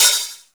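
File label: OHH -L, open hi-hat, Drum & Bass; Ueberschall; Drum & Bass Resonance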